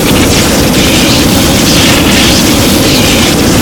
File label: flame thrower loop crit; tf2